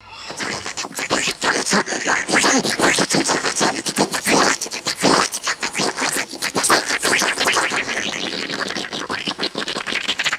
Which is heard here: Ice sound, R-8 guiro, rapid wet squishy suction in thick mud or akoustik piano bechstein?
rapid wet squishy suction in thick mud